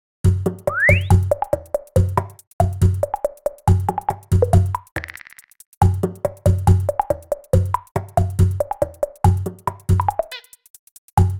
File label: Get Fanfare Music